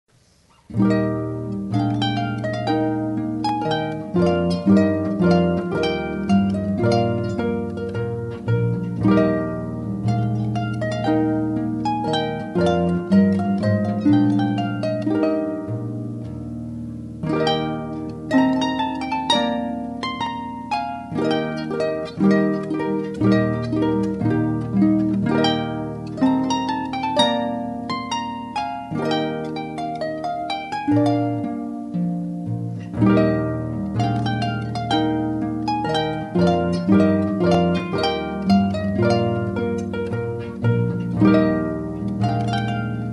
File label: Trumpet Voluntary